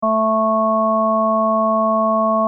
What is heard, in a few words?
filtered, acoustic